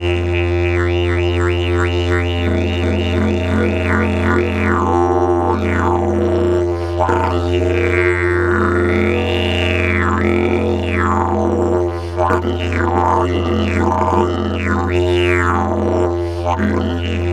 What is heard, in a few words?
F LONG DRONE, Didgeridoo; Big Fish Audio - Didgeridoo and Other Primitive Instruments